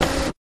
Dot Matrix Printer Form Feed, Quick

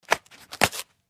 Detergent open box

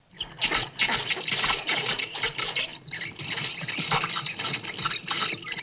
Metal Pulley Squeaks, Block and Tackle, Loop

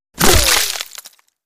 Bullet Impacts Wood I

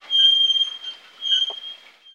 Car Brake Squeak

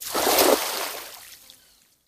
Net Being Cast Water, fishing boat

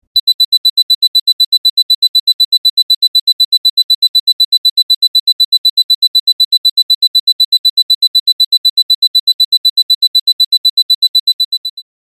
Alarm Beep Timer; hi-tech-various

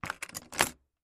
Latch Close, trunks

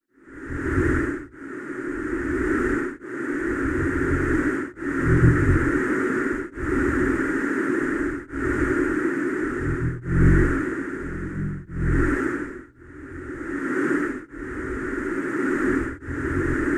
alien breathe; aliens

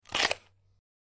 Bite Vegetable Peppe

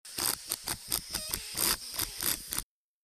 Tool Air Chuffs Sput

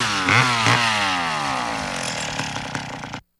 Chainsaw Rev Then Tu, chainsaws

